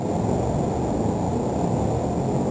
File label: static